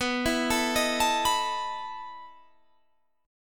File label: E Major 7th Suspended 4th B